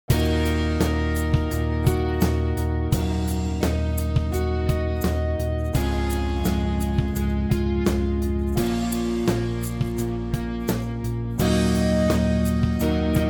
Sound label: sad rock